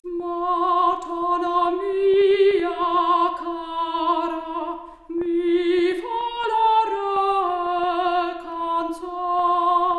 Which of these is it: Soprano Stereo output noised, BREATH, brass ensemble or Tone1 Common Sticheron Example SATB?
Soprano Stereo output noised